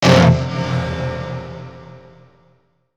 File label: Scare